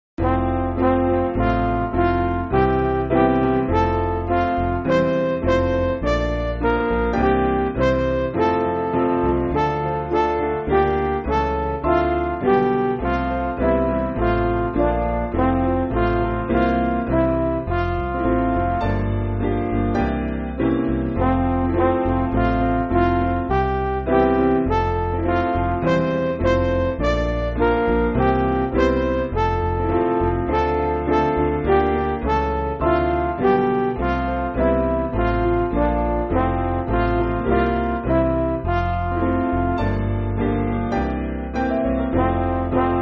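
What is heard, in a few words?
Midi, Music Backing Files for Churches; Stuttgart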